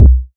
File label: round kick c key